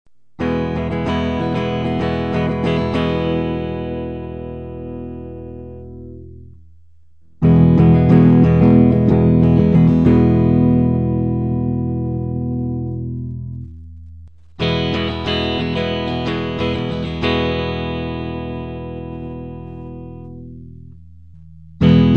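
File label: Purple Peaker, guitar; Clips 1 & 2 order: both switches off, lo on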